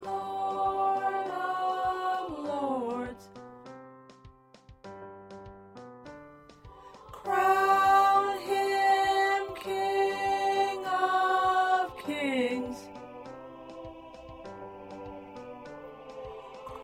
1st Tenor

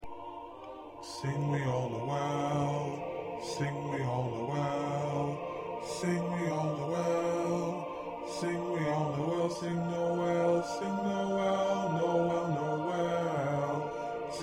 2nd Bass

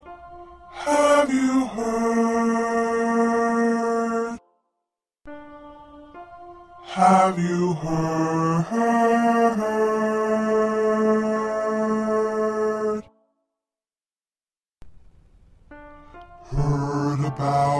1st Bass

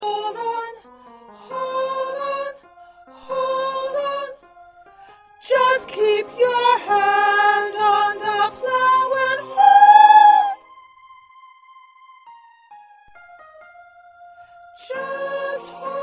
Low 2nd Soprano, choir parts

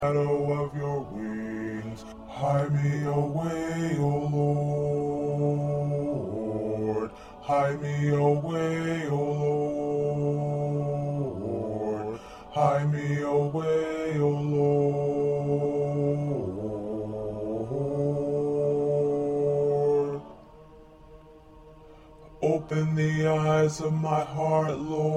1st Bass

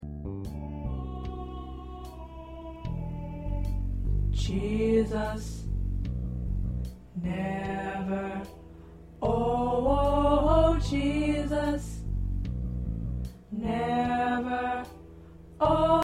Tenor, choir

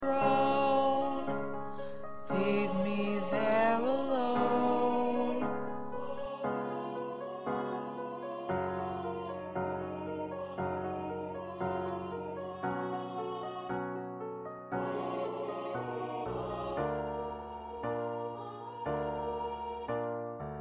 Lead

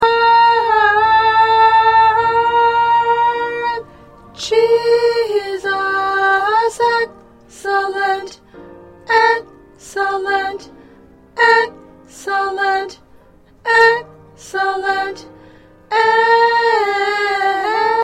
Alto, choir parts

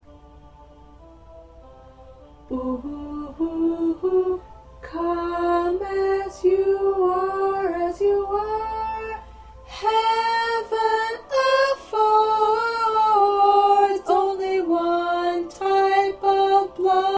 Mezzo

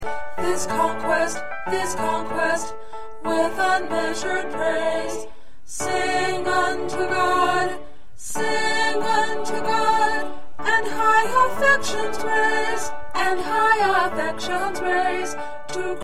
1st Alto

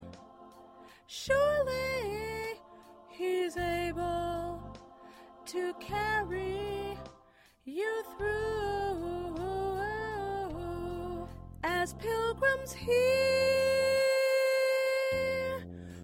Lead 3

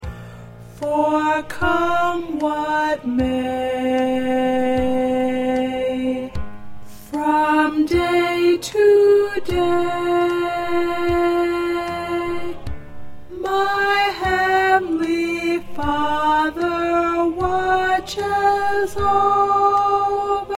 Alto; spirituals and congregational songs

